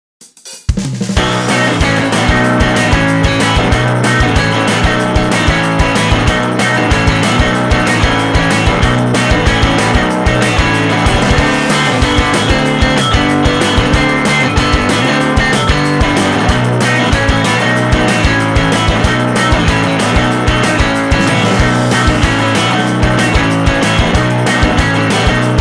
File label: Miss Swing